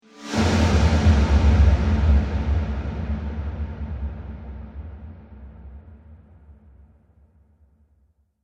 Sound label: Trailer Hit Swoosh